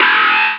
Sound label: Dark Note, Keyboards